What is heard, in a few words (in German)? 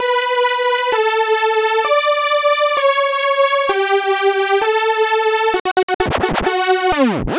Lead Gate Scratch mit Groos Beat